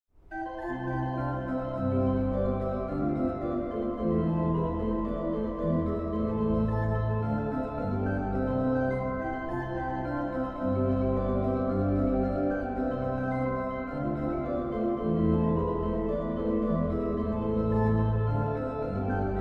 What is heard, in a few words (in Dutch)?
Fantasie (2 orgels); Neem heer mijn beide handen